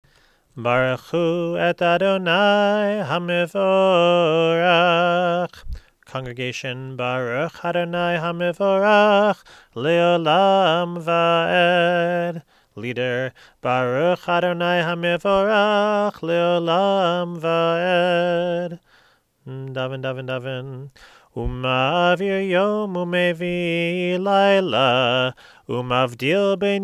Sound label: Barchu Umaavir; Red Book